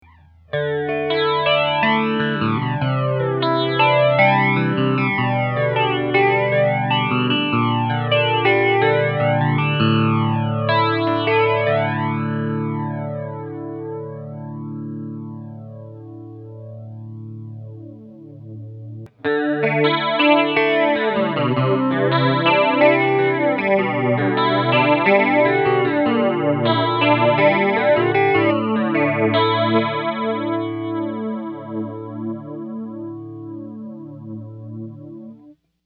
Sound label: flanger; manual Min; Mobius